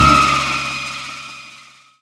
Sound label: Stab Fade; One Shots